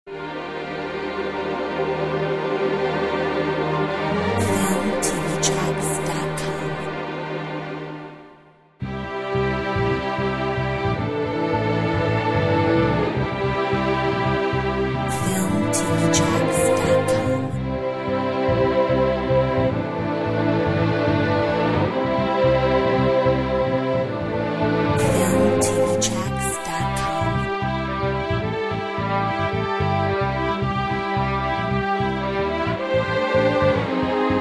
New Clocks no drums, Uplifting Inspiring Royalty Free Music; Corporate, POP; Filmtv-tracks